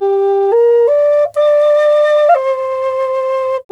G 130, FLU RIFFS, Didgeridoo and Other Primitive Instruments; Big Fish Audio - Didgeridoo and Other Primitive Instruments